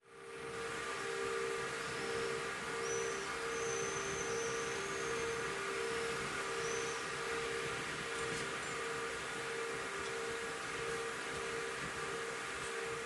outdoor early morning cityscape with air conditioning system; Sonniss GDC